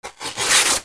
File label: RIPPING